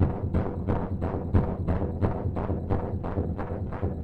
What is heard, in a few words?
MACHINES; Zero G; Malice In Wonderland